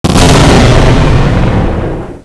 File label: boom